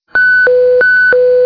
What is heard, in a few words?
notification